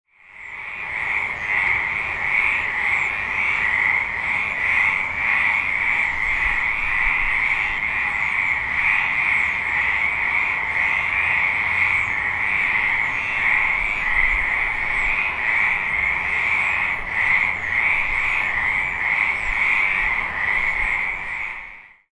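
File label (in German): Laubfrösche; spanien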